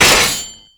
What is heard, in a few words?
metal slash; Fate The Cursed King